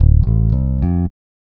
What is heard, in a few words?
bass bad news